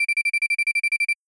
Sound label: ringtone beep loop